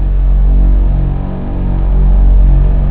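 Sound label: synth string; jazz jackrabbit 2; M8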